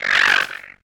Monster Bird type Attack

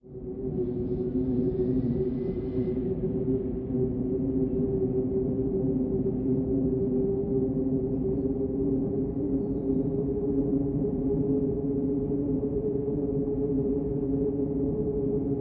eerie wind; Ace Lightning; Windows

